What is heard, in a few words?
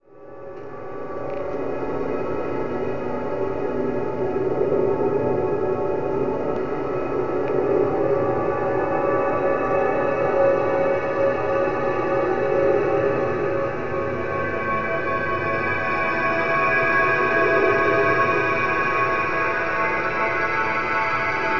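portal ambience; openffa; fadersounds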